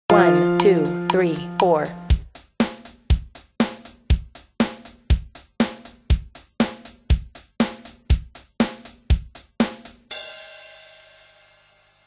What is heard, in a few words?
Twinkle Female accompaniment